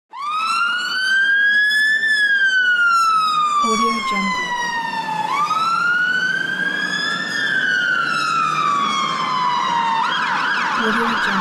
fire engine at city

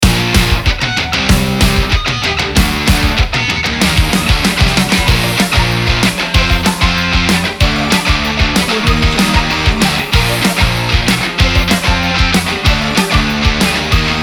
pop punk rock

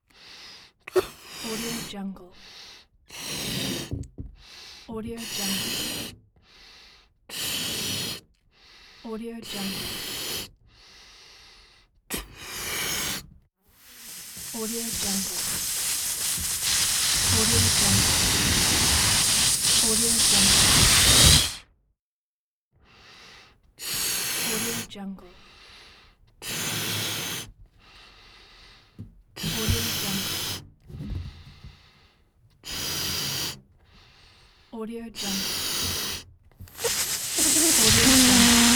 balloon inflation and deflation

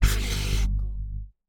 scifi warp energy discharge

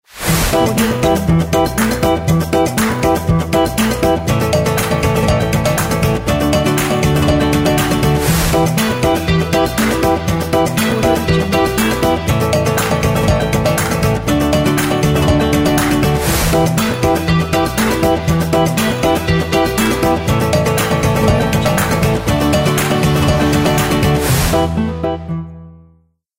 advertising happy logo

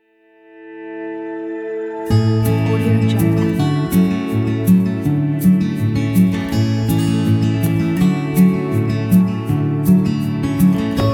relaxing acoustic picking; jaredstevenson

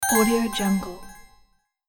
ding

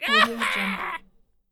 goblin cheer

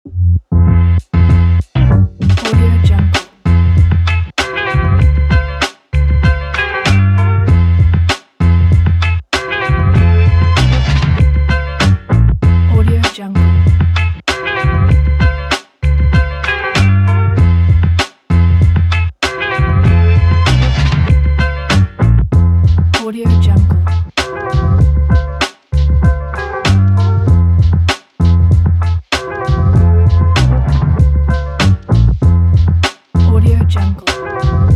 good vlog

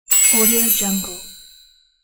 cash win

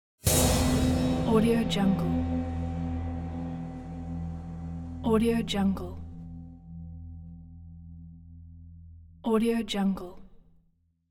cinematic impact

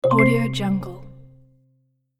super marimba; super marimba select; sonic-boom

